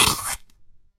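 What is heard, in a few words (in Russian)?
bystryy ukol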